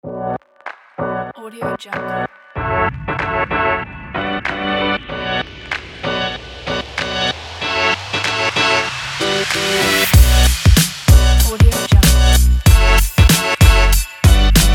this is beat

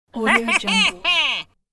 cartoon nasty envious laugh

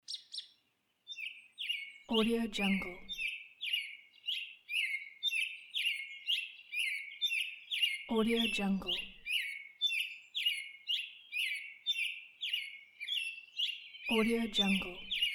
birds in spring

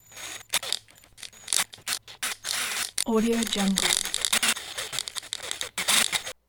servo lens jammed; soundmorph

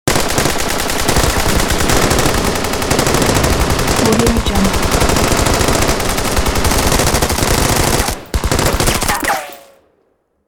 machine gun battle; sound ideas

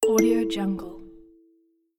button menu

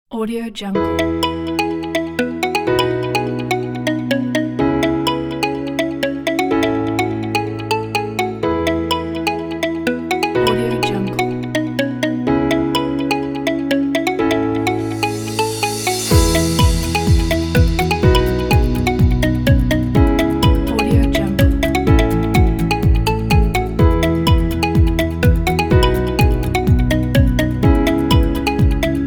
positive music vibes

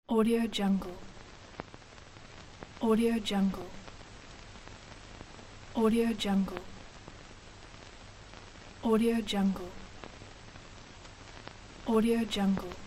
old film cassette sound; soundersin

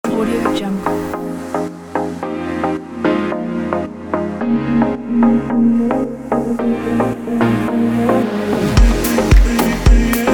house club kit

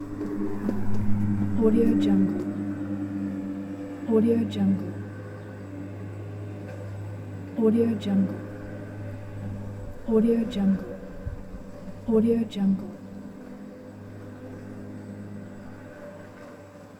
airplane

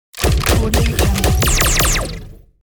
robotic laser shot

